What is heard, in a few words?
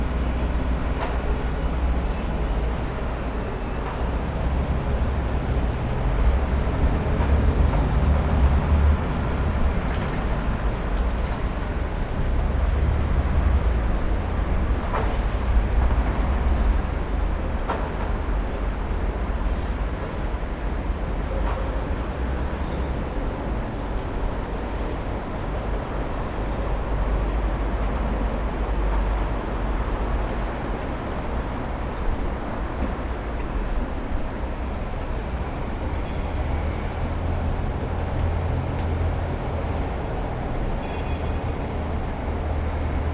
outside